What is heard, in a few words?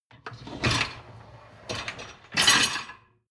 dishwasher lower tray draw out; maschinen; spuelmaschine